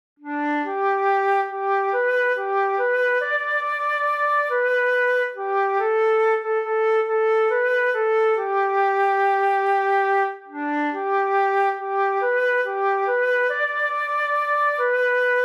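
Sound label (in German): Querflöte solo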